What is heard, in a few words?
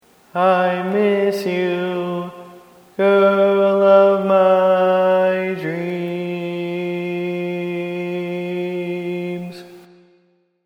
Lead, barbershop tag